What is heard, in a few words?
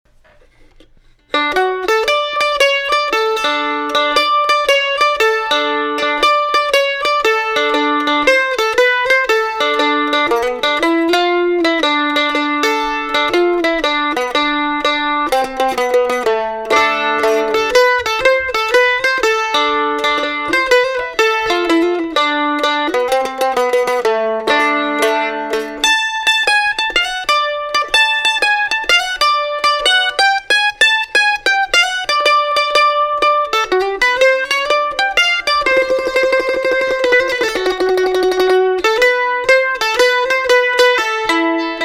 bluegrass stomp